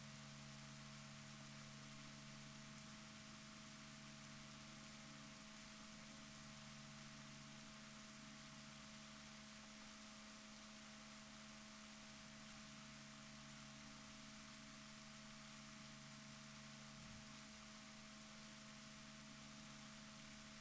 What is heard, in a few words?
rorquals negatif, Balaenoptera Physalus Chile